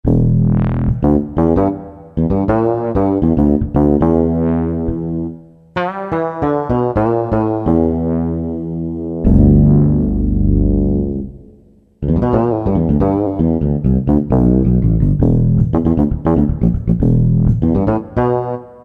BA Frtless, Yamaha TG77; Factory Presets

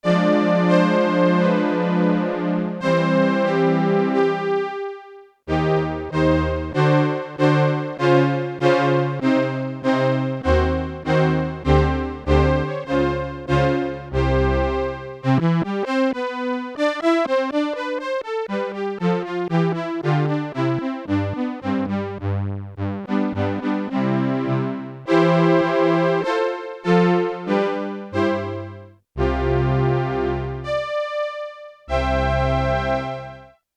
BOWED STRINGS, Korg DW-8000; Factory Patches

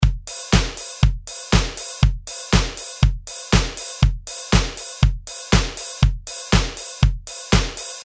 DISCO, Roland R-8

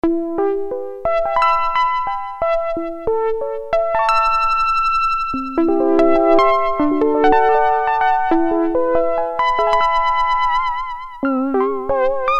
Echo Repeat, Prophet-5; Sequential Circuits Prophet-5 (Ver.3); Factory Patch

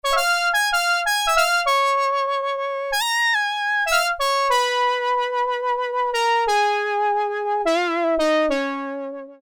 Harmonica, Korg Poly-61M

